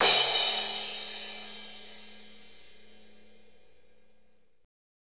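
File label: cymbal crash, fast rock, kit dry, Roland; Northstar; Drumscapes Roland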